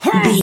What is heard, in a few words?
voice male effort jump